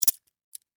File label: Emergency Handcuffs Key Turn Latch Open Clicks